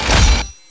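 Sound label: gauntlet shot, weapons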